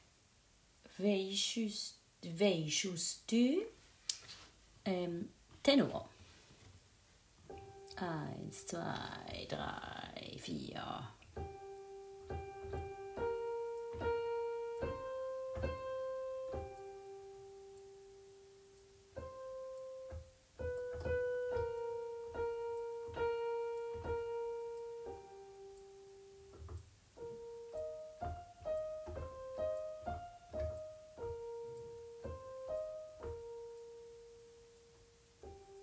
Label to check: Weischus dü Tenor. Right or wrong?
Right